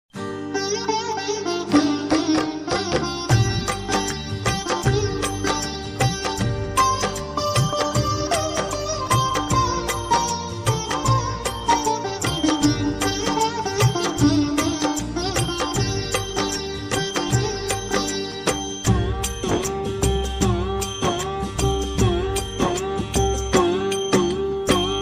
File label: Bol Hari Bol Music; ringtones